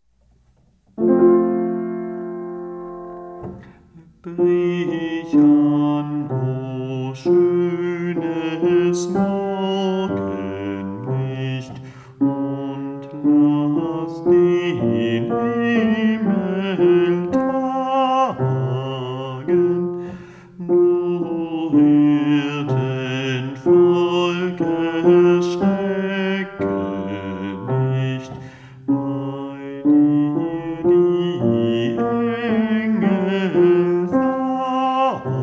Brich an Bass

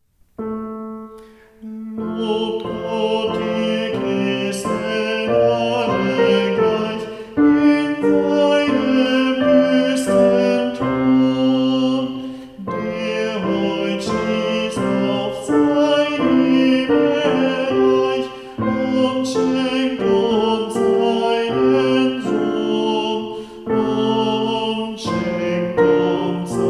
Lobt Gott Tenor

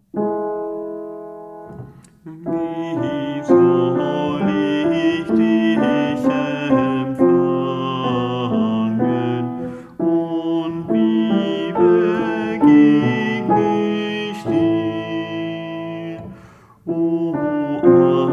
Alt, bach choraele

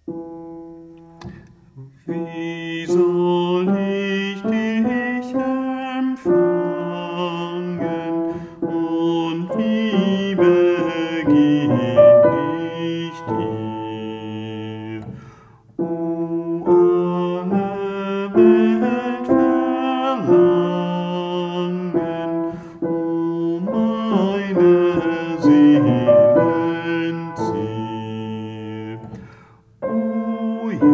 Wie soll ich Bass

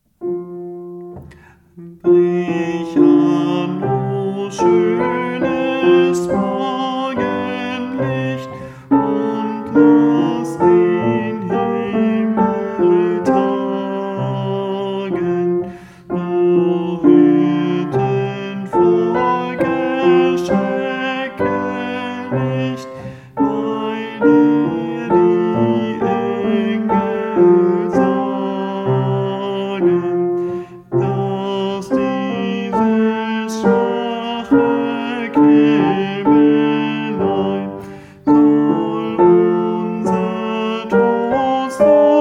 Sopran, bach choraele sopran

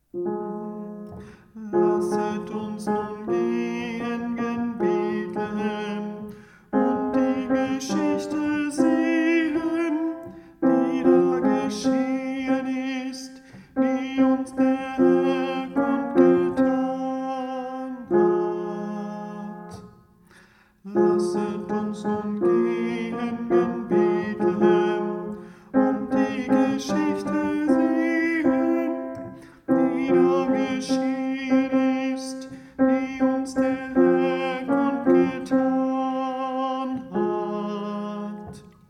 Tenor

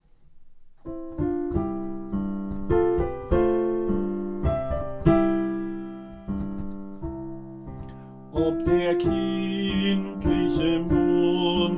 Alt, adventsmusik

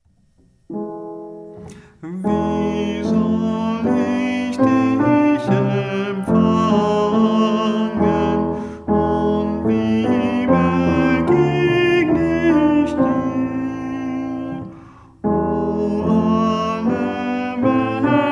Wie soll ich Tenor

